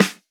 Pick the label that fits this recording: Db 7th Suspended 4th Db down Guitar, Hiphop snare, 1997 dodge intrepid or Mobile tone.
Hiphop snare